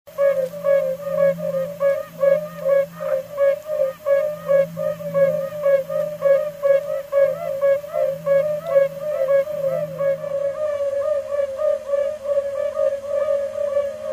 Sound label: Bombina variegata